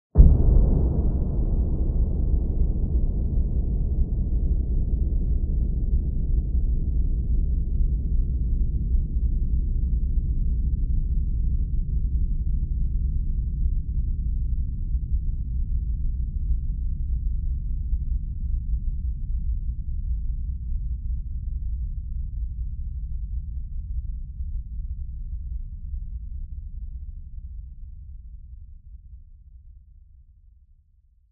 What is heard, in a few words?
thunder drum; Sounds Effects; StudioLucid